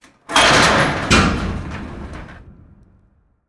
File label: doors close